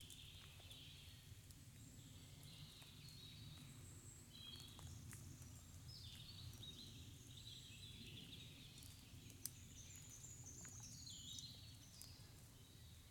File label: Forest; InMotionAudio; The Forest